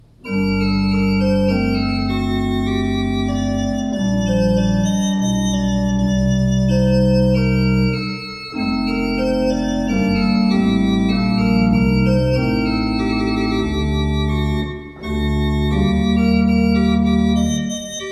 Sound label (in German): Walcker, gebrauchtorgel